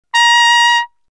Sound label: trumpet